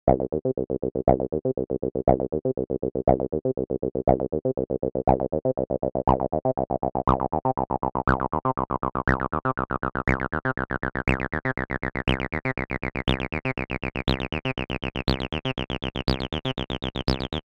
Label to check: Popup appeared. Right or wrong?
Wrong